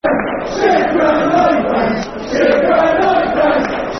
Shit Ground, No Fans, Football Chant (Soccer Song); Watford Wfc